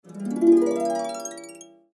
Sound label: harp